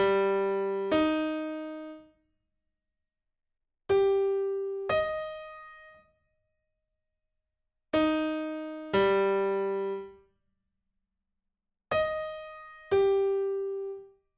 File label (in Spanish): intervalo sexta menor